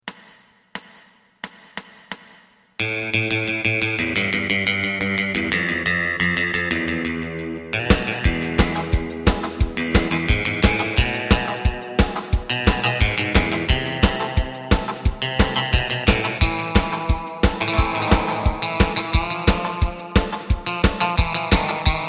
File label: bass; エレキバンド用カラオケ